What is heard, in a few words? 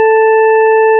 organ 441; SoundPoolTest